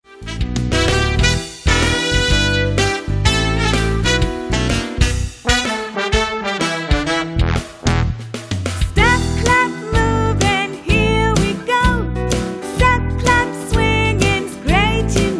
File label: Swinging Bones